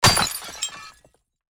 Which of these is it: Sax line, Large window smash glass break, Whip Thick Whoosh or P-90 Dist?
Large window smash glass break